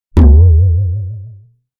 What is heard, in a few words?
Plastic Tub Impact With Water Hit Pulsating Low; Hollywood Edge; sound designer's toolkit v2